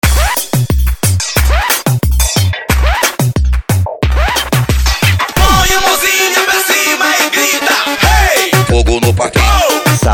TUBA GAS PRESSION

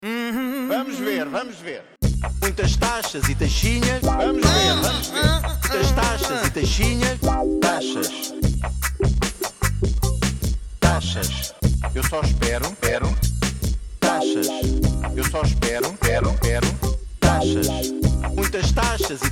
Rap

